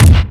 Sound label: Hit Kick; A player getting hit by kicks (weakest to strongest; #2 and #3 also used for Link's boomerang hitting)